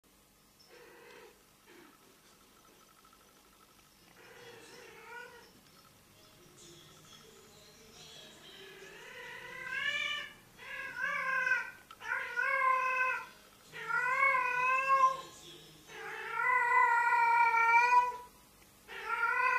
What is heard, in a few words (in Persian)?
صدای گربه فحل